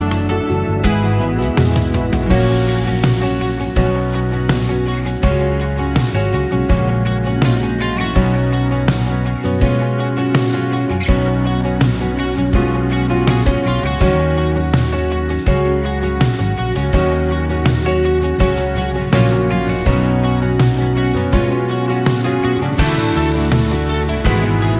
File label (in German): Playback ohne Backings